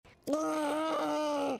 ziegen geräusche; jinja

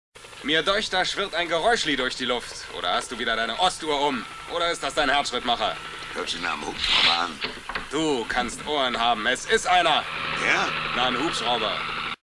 hubschrauber

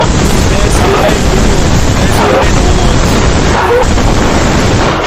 schlechtes mic schreien

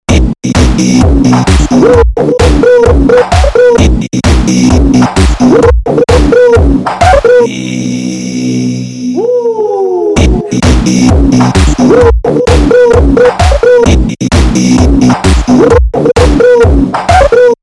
ihhhh only musik

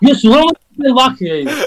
lachen

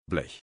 blech

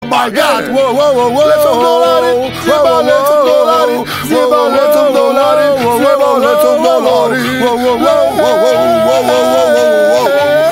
bambus pedal

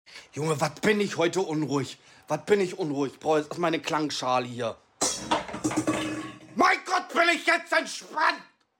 Klangschale

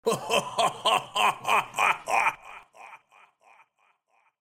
lachen

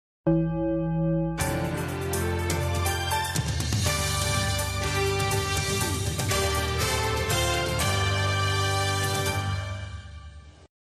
melodie ohne sprecher; tagesschau

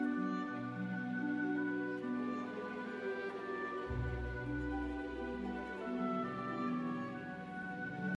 schwanenmusik

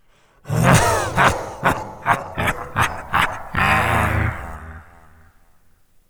lachen

